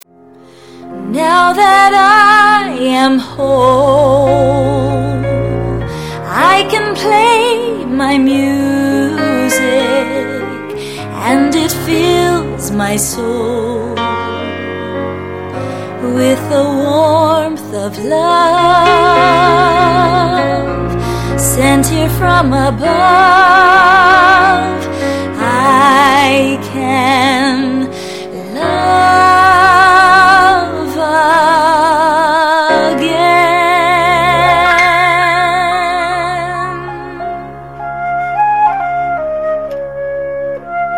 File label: melody